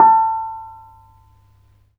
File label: BONANG A5; Spectrasonics; Heart of Asia